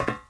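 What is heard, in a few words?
boot metal walk left, footsteps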